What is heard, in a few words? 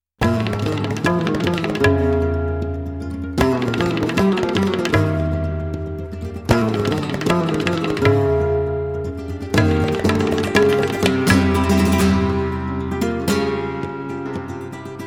Bulerias, spanish guitar